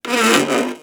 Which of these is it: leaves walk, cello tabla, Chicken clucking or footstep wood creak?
footstep wood creak